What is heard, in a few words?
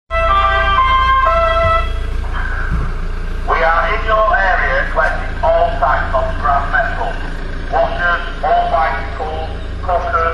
scrap metal truck